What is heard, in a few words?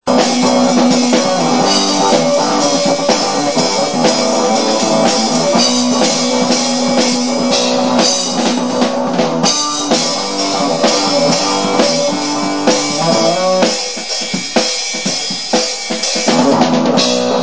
cam drum kev guitar